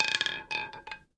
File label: ball Soft Stop, european roulette; europeanroulette3